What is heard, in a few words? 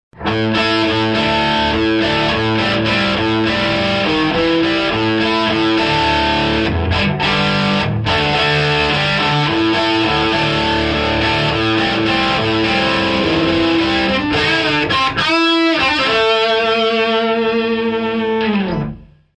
hiwatt, amps